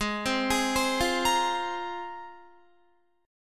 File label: Ab 6th Add 9th, Guitar; Ab6add9 arpeggiated; 16,15,18,17,17,17; 4E,4A,5D,5G,5C,6F